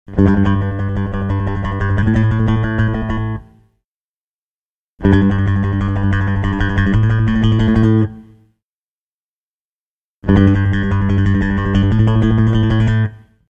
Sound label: Rock Bass